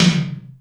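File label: Md Return, Alesis D4 drum machine tom; 300 Drum Machines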